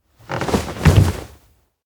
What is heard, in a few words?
support stumble; chiharu; anemoi